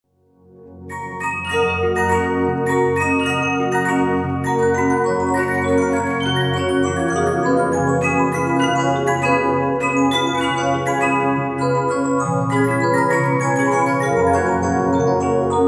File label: Treasure Waltz